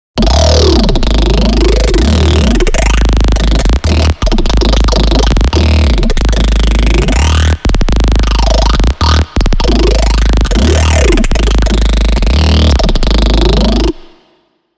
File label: Growl Test